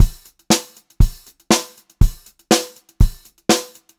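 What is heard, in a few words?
120 pop variation, Pop-Rock Drumloops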